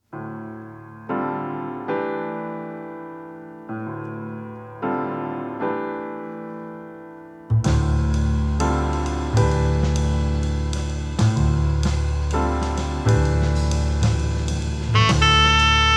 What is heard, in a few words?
Quartet